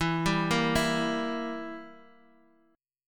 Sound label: E Diminished down Guitar Half Step Down